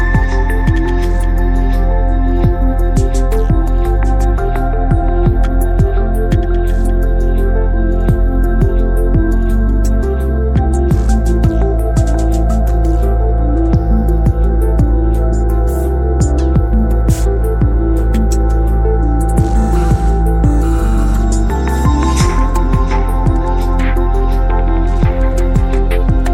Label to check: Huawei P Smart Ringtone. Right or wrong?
Right